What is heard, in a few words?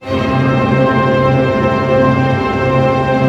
TREM C FF, orch trem; Sound On Sound - Peter Siedleczek's Orchestra